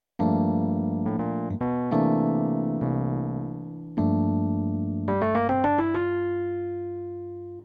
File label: droan